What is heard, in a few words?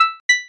notify